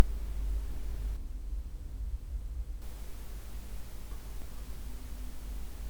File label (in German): Eigenrauschen RODE TF 5 NT55 Lewitt LCT 140 AIR